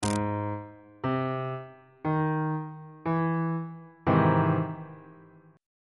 f flat augmented major 7th 1st inversion

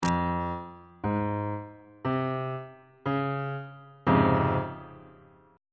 d flat major 7th 1st inversion

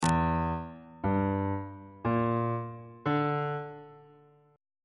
e half diminished 7th chord intervals

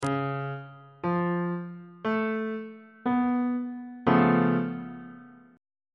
d flat augmented 7th chord on bass clef

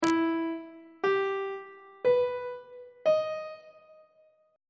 f flat minor major 7th chord intervals; on treble clef

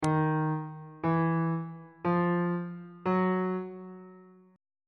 g sharp 6th intervals on bass clef

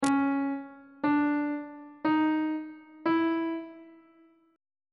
d double sharp 7th intervals on bass clef

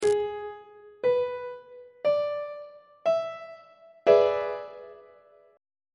d double sharp dominant 7th 1st inversion; treble clef

